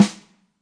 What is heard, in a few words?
real, REAL LIVE KITS; A Real Freakin Drum Kit